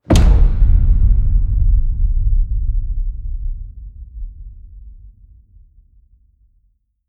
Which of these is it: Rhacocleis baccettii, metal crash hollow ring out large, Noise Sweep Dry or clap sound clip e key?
metal crash hollow ring out large